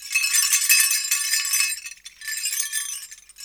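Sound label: Tanzania bells; Roland LCDP14 Africa VOL-2; Perc FX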